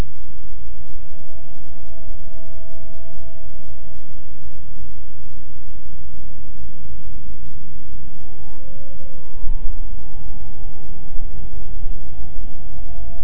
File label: two wolves